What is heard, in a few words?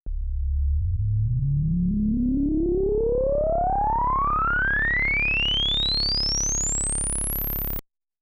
Ladder Reso; synthmaster